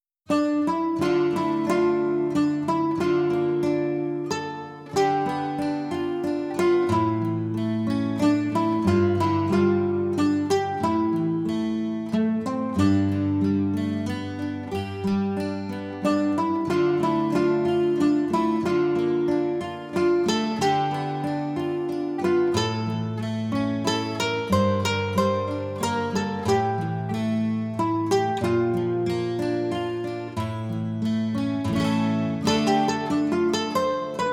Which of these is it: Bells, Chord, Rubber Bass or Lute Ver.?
Lute Ver.